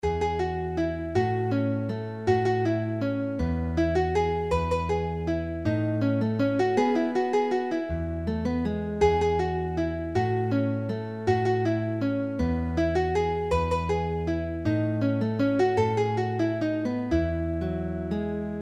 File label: Mazurka